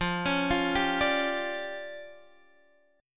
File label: D Minor Major 7th Double Flat 5th, F